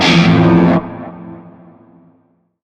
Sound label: Shawzin Maj Chord F; Music; Warframe; Narmer